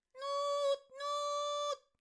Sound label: Fan Bearer Attack Vocals